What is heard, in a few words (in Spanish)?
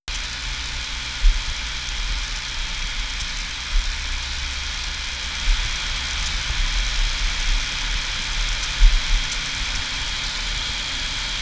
pitido